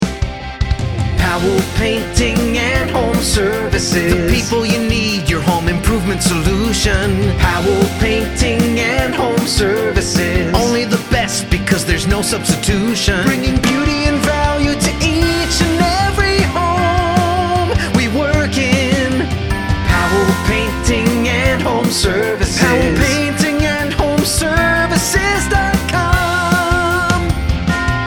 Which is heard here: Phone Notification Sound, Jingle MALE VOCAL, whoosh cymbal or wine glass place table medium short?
Jingle MALE VOCAL